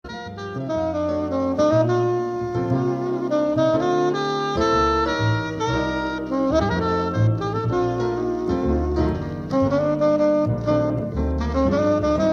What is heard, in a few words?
Sax trio